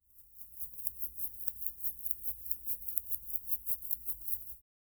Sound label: sand attack